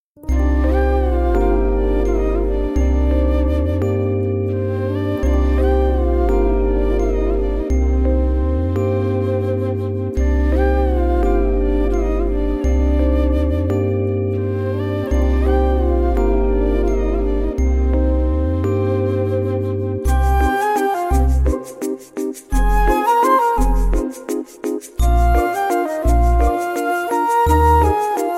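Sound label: background music ringtone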